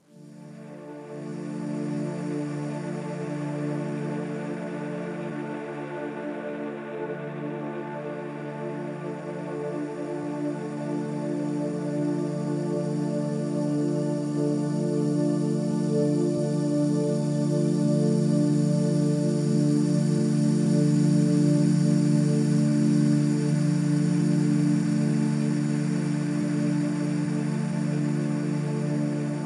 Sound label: enigmatic dance 432 HZ